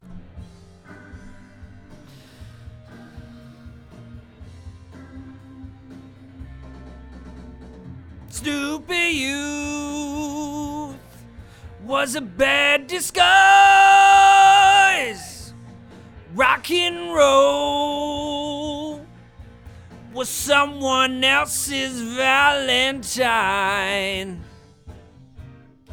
VOX Double

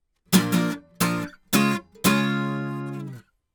Act Guit Comp L

